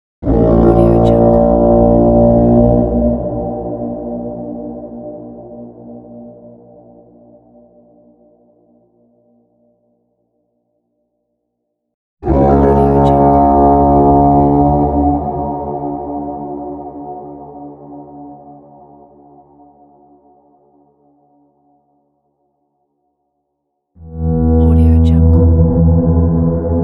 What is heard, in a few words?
battle horn; pashastriker